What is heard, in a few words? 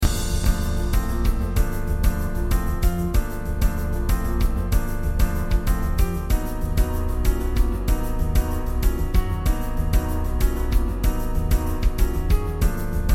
Battle Theme Loop